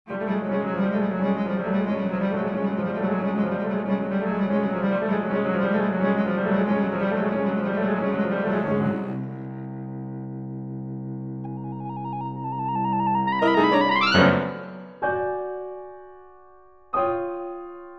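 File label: Trio Clarinet Cello Piano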